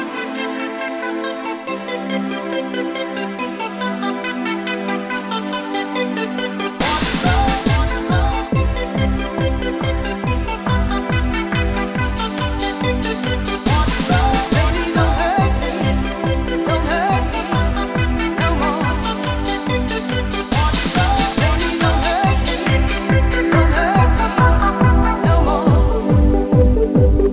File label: bar default